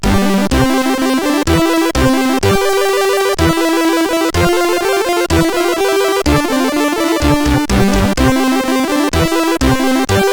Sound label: crack intro, CSG8580R5; sid; Genesis Project